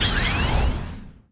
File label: Brew; Thunder Fighter